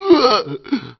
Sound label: zombie attack, male